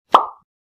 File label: click sound